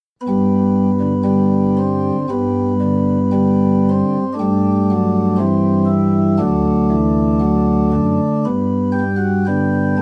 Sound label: accompaniment